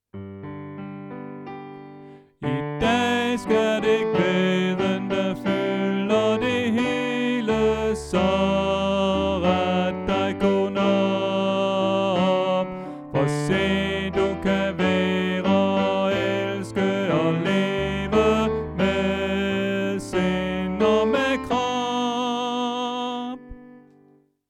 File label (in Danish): Tenor; oevefiler